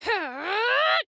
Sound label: Charge